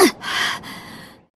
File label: Grunt; Lewd SFX